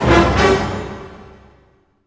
HIT, orchestral; Orchestral Loops; FULL ORCH ACNT

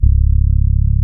Fretless Bass

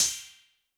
Cymbal, Yamaha DTXpress-II

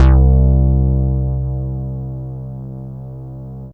Single Electric Basses, Dance Floor Bass

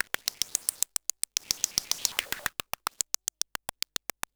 MiniBrute 110BPM, Gated Seq Noise

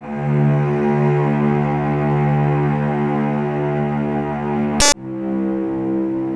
String Ensembles Cellos D 2; Miroslav Vitous; 90 s Sample CDs

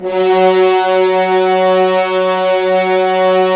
Horns; Roland - LA Composer vol. 4

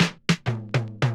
LOOP, ROLLS; X-Static Goldmine 2

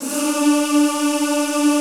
D3, Extended Classical Choir; Best Service

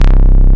DM BAS, Dance Mega Synth

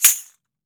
JINGLE CLAP, JINGLE BELL; AKAI S-Series CD-ROM Sound Library VOL-5

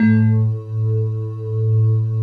BELLS AND; Synclavier Sampler Library; KEYS AND GUITARS

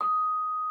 VIBP D#6, VIBES; Xsample VOL-4

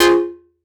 stab beast F, Noise; stabs

